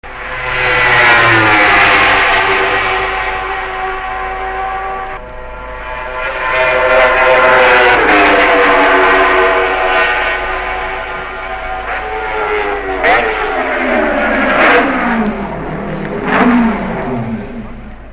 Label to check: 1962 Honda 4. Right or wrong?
Right